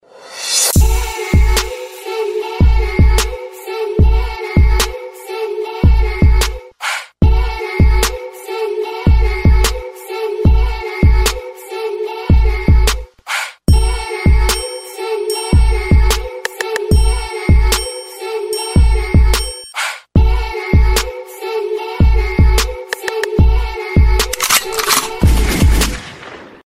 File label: arabic rap beat